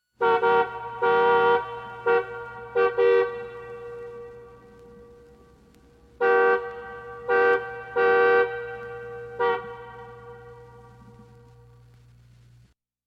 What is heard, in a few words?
Car Horn; This sound effect was recorded in a custom built sound booth